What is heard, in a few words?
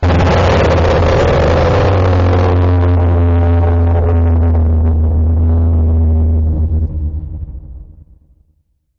jumpscare sound; the forgotten one oblitus casa